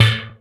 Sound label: DR. LOOP; X-Static Goldmine 3; CHORDS